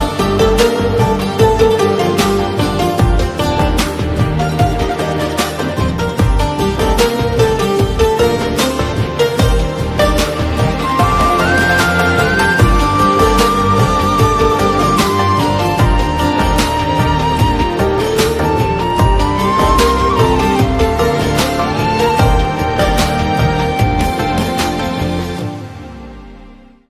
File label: Instrumental